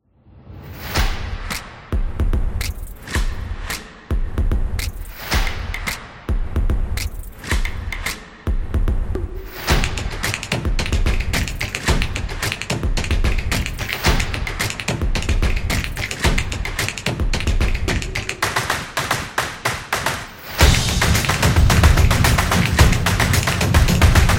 Drum Clap Snap; fast tempo

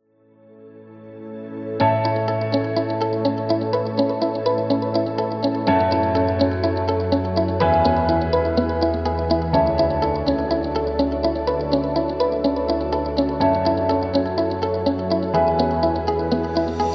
Food, Corporate background music; corporate beat

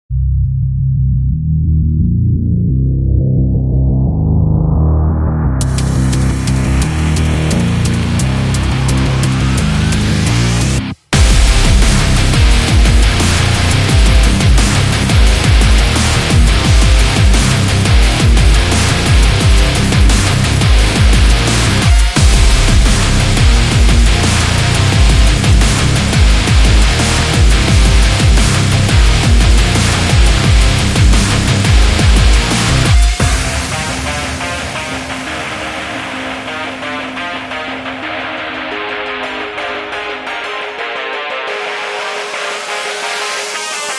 Rock Dubstep Sport

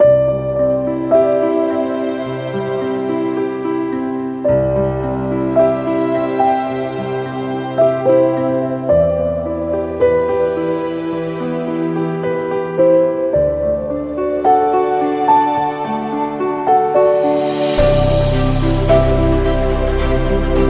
Wedding Piano

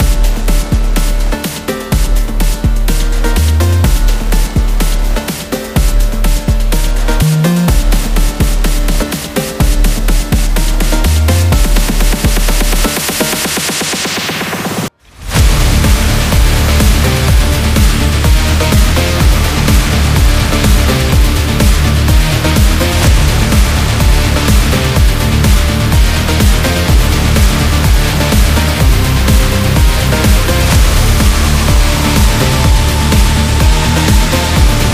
Phonk Metal

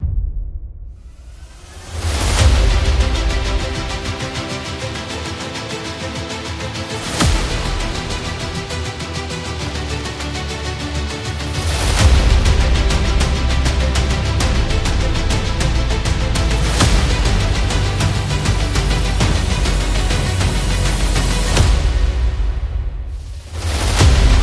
Patriotic

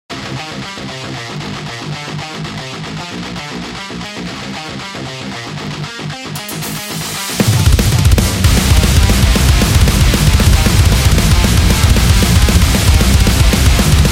The Metal

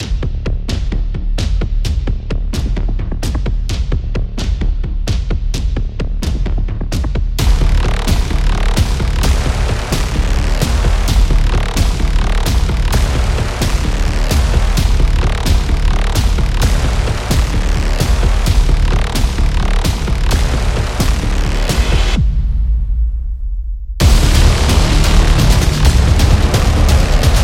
War Cinematic; war action

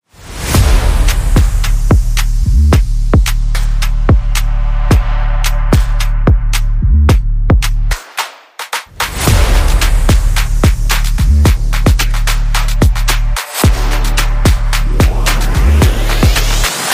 Rage Mode Activated

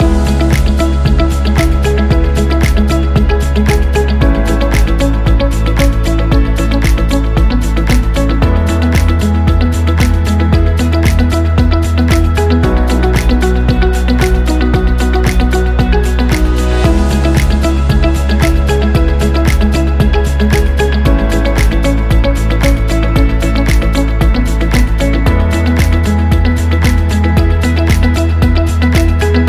Corporation Loop

